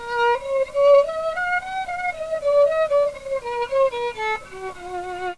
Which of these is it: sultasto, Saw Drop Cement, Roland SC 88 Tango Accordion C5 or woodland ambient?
sultasto